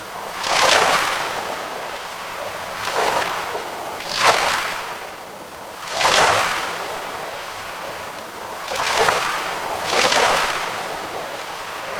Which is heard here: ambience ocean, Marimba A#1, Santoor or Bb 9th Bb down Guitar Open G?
ambience ocean